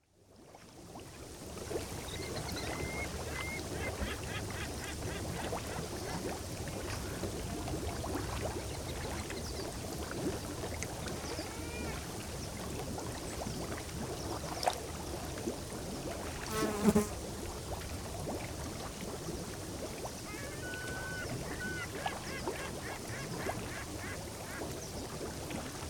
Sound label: Mono Lake Bubbling Springs